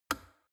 plastic water boiler turn switch off hard

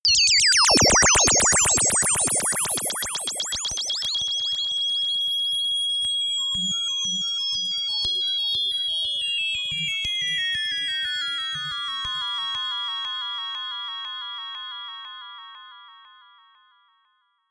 atonal rhythm drop riser

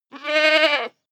goat baa male

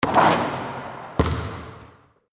basketball hits rim

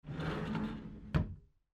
wardrobe

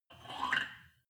weight rack pull out

